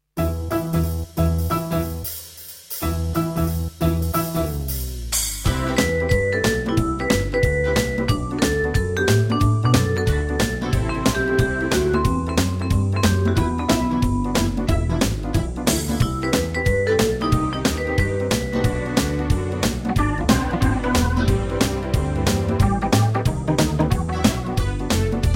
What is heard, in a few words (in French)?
Boite de Jazz